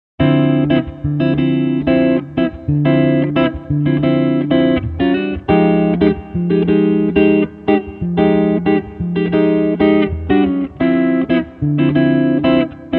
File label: clean; es335